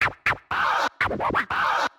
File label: sound machine sample; hof furni; arcturus morningstar default swf pack